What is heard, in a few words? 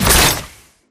Mvmt Servo Jump; AI Stalker; Titanfall 2